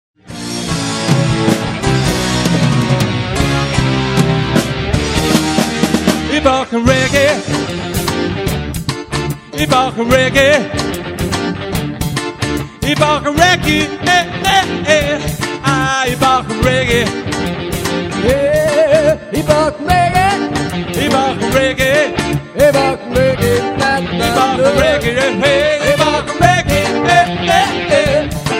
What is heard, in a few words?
REGGAE